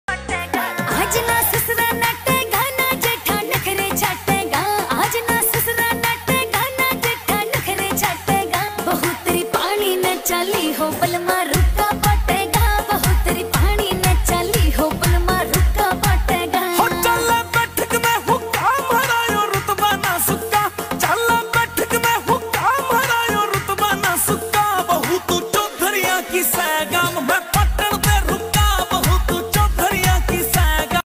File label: haryanvi ringtone